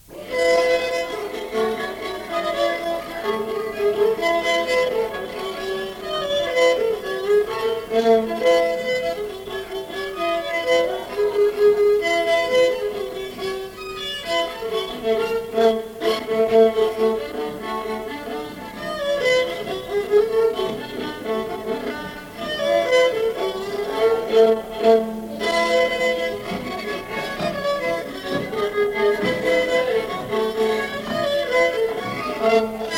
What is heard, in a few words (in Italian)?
violino; cuneo